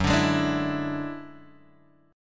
F sharp 7th Suspended 2nd Sharp 5th, Guitar, Drop D